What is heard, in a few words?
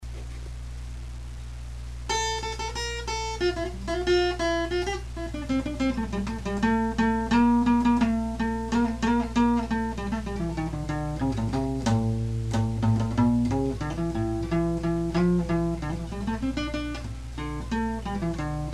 phrygian jam; just messing around with the guitar